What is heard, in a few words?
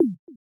perc